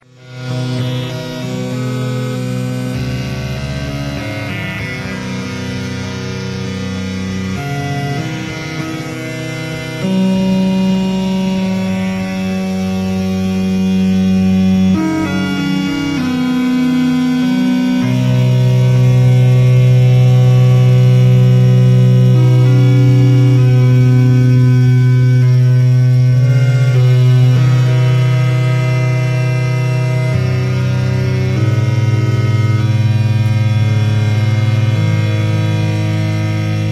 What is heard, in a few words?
Electronic Recordings From Maui Jungle